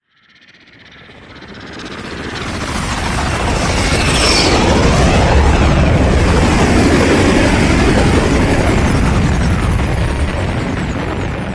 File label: Relay Docking; Vehicles; Blue Sky Liset; Warframe; Liset Flight